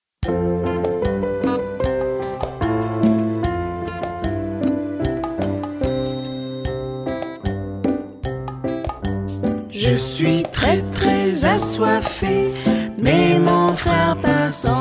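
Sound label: Song, French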